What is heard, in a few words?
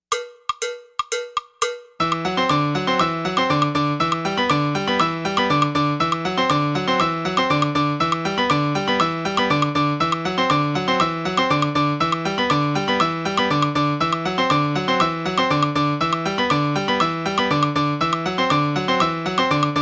tumbao fast